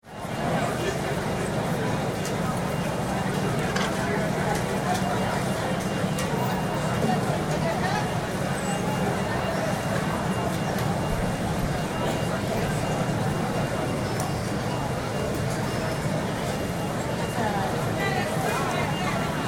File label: Ambience, Airport, Walla, Security, Check, Point, Interior; Airport Sound Effects